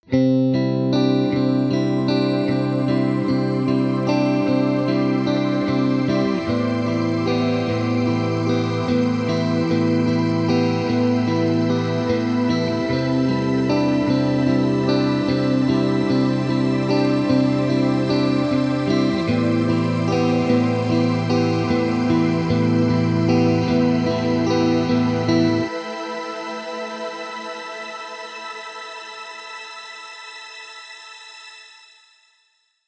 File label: guitar synthy; Valhalla